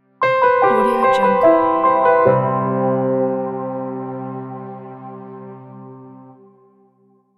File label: idea logo